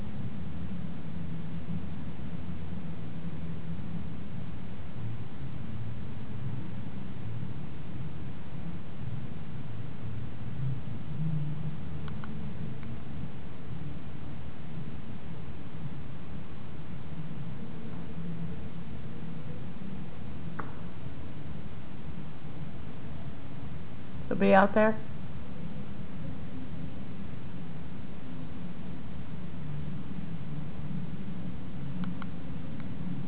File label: humming and knock